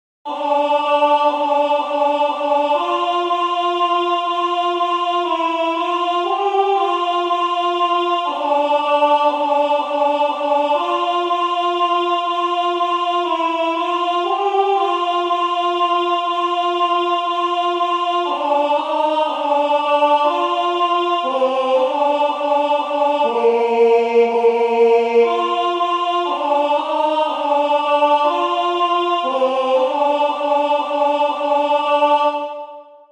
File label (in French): alto; belle qui tiens ma vie